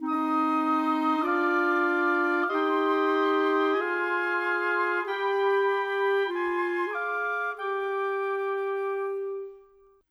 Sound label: Winds, Orchestral; Sonic Foundry (Sony Creative Software) - Orchestral VOL-2; Modern 36